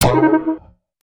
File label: UI Arrow Indicator; aronwk collection; ndaudio